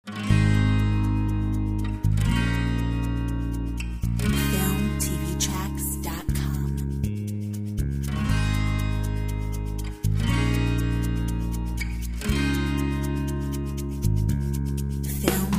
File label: Feeling lucky whistle mix